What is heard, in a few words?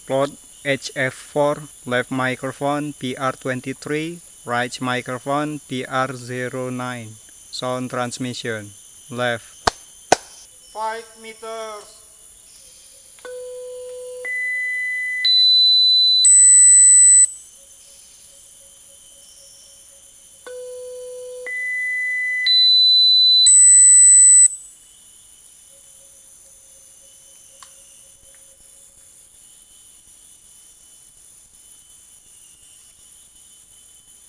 birds